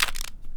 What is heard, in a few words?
Small Cube - Twist, Rubik's Cube